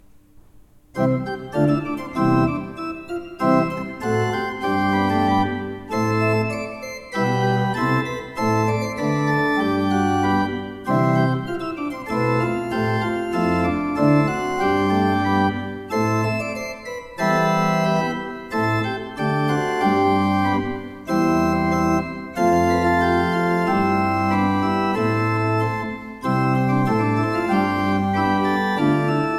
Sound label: pipe organs; Steinmann